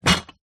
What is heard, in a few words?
foley bottles glass empty in cardboard box set down